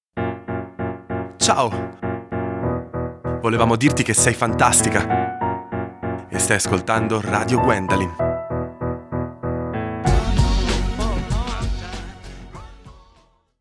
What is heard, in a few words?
Jingle